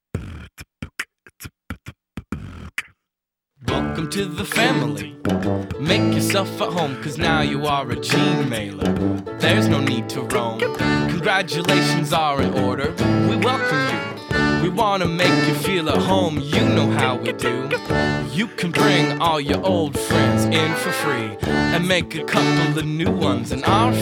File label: hiphop hello